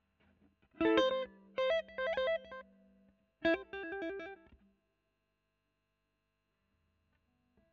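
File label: E.GTR Royer OD, electric guitar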